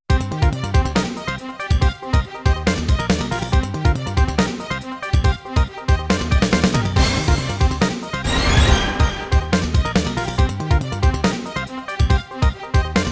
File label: SYNTHE; kenshuui tend dokuta original soundtrack